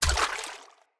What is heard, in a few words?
Step Water, Footsteps; Enemy; Corrupted Sproutfolk